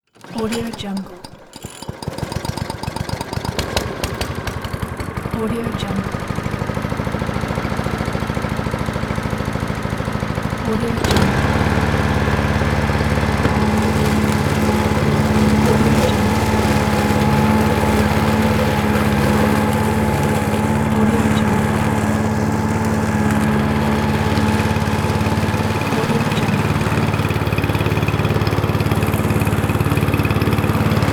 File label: gasoline lawn mower starting and running